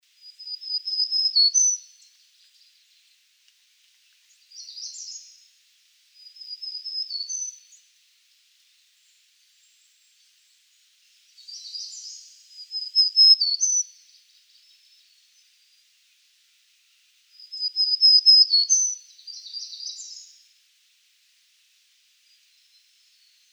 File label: Black-Throated Green Warbler